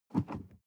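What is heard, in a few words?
sports car interior pedal gas step and release; autos; verkehr transport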